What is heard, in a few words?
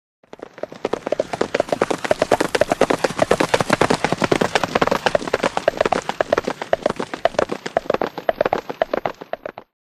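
By 2 Horses Canter Up Past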